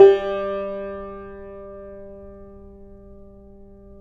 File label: yayli tambur; Guitars; Spectrasonics; Hans Zimmer Guitars VOL-2